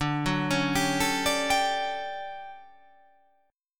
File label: D Major 11th D down